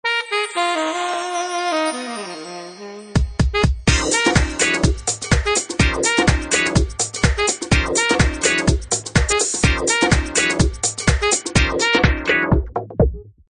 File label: Fuse, Ringtones; Nokia; 2626